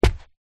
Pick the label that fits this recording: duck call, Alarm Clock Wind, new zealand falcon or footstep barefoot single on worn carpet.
footstep barefoot single on worn carpet